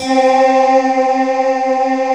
C4, Choir Acoustic & Synth; USB Soundscan vol.28 - Choir Acoustic & Synth; AKAI

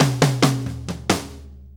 4-4 Fill, Drum Loops; Sonic Foundry (Sony Creative Software); Loops & Samples Drum Tools

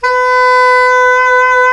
SAX SOP MF, Soprano Long

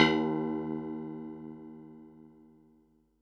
Perc, Percussion and FX; Pearl SC-40

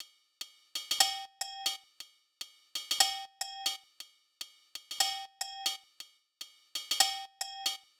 Loop 120, Perc Loops; Equipped Music; Loungin House

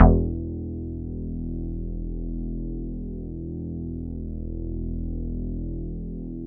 bass, synths

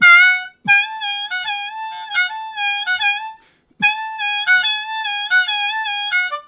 Swooper, Harmonica; Zero G

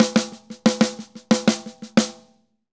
Drums Poly; Best Service; Festa Latina VOL-1; Drums & Percussion

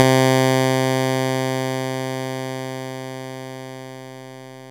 RY30 Pulse; Drums; Yamaha RY30

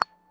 A 5 FUNK SFT, GUITARS, FUNK STRAT; Spectrasonics; Hans Zimmer Guitars VOL-1

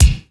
AX MIX BD; AKAI S6000 CD-ROM - Volume 1; DRUM RYTHM

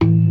CLASSIC C3, Synth and Organ

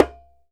DJEMBE, African Perc

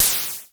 KLONE NOIZ, Klone Dual-Percussion-Synthesiser; KLONE NOIZ NW8

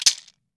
MARACAS; Cuba

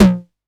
909, DIST; Wizoo - Platinum 24 Electronic; 909 DIST P1

